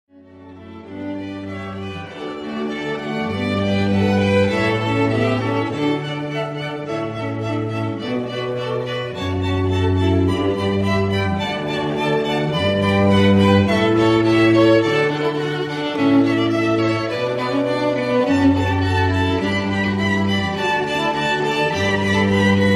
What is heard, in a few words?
canon in d, string quartet trio; pachelbel